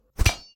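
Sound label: Weapon Generic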